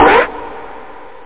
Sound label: SCRATCH; 8,363 sample rate; Amiga 8-bit Sampled Voice